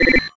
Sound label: missile lock; allegiance